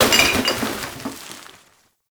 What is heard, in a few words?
Garbage Bag Destroy; Ambience; Gameplay; Warframe; Nineteen Ninety Nine